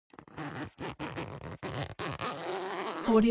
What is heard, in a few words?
zipper down jacket open unstable; foley; smartsoundfx